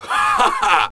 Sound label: LAUGH; BattleSNDS